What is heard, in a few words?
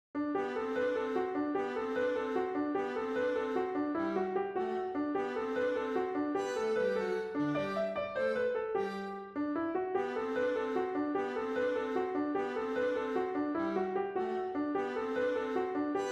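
jig, melody with viola cello; Crooked River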